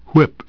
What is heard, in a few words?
whip